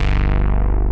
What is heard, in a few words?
BIG BASS; Pro-Rec - Synthsonic; BASSES